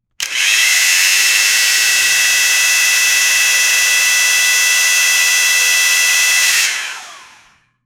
40 liter 90 cylinder, redline

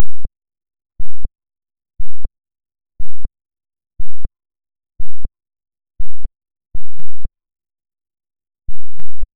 miss sound if the player

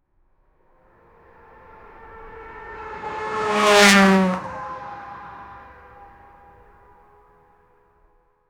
porsche fly by passing

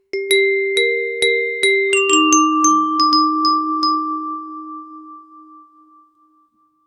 minimal clean bell

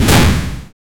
a quick short powerful li, magic

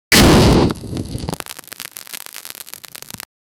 an object soaked in gasol, elements

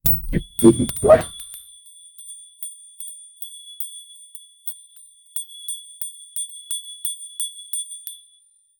bell

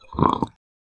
sudden hollow skull roar, teeth snap

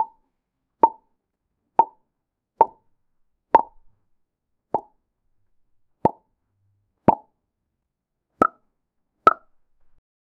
a surprised boing stretch, quirky

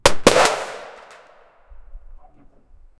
cannon

